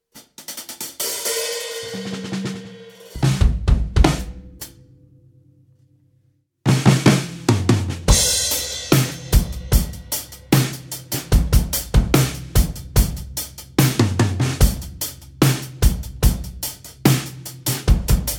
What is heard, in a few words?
big beat